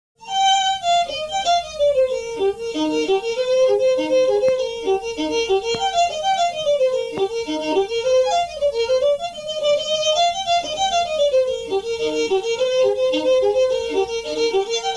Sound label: reel fiddle; tune tutor